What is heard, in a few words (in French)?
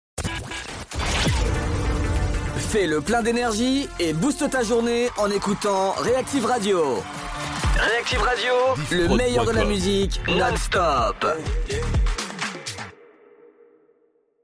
Radio Jingle Boost ta journee; Reactiv